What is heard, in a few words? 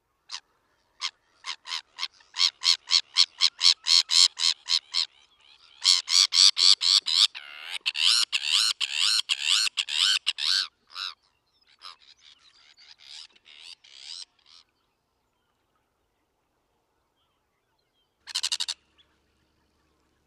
Sabine's Gull